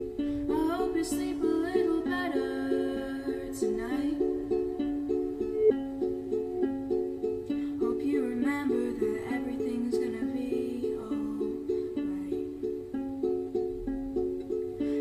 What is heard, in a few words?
Lullaby